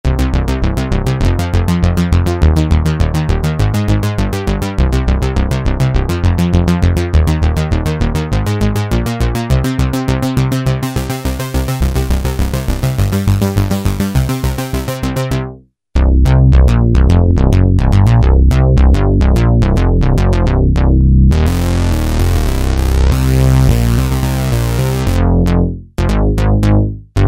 Korg microKORG 80's Synth Bass; Factory Voices

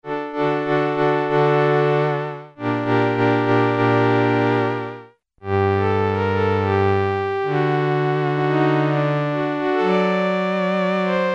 8-Note Strings, Korg Poly-800

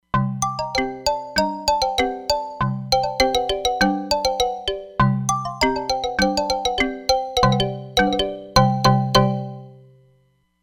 Ethno Keys

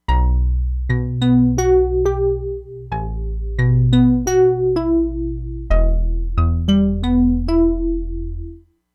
DIGITAL PIANO + DIGITAL PIANO, Crumar Bit 99; Factory Programs

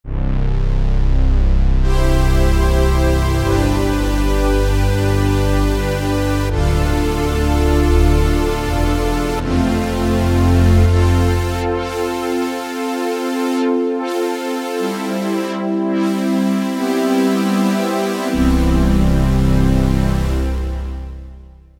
Massive Pad, Roland JP-8000; Factory Performances

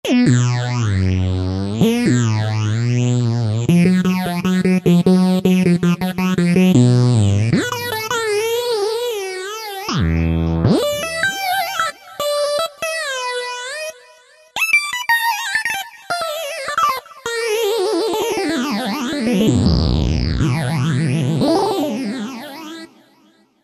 Tap Sequence, Roland JD-990; Factory Patches

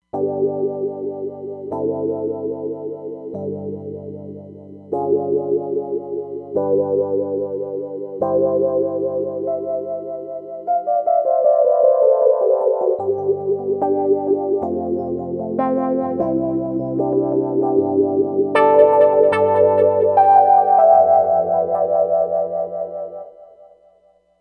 Psycho EP, Roland Fantom XR

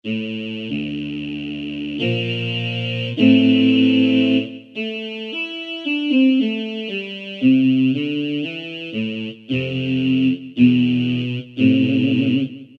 Male Eeee, Korg microKORG; Factory Voices

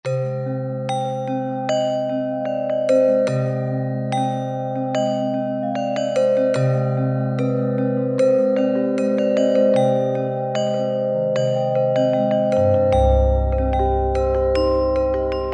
Gamelan; World; Roland SR-JV80-05

